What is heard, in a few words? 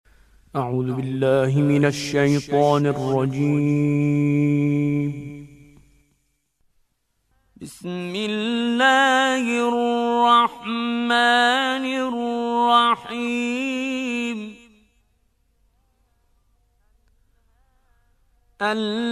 Mujawwad; Mansouri